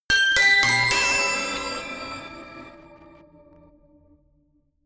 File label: notification glockenspiel crazy sequence ascend